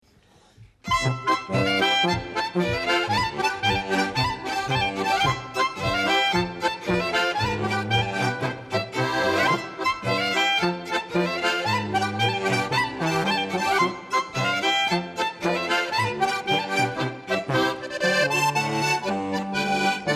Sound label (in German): Polka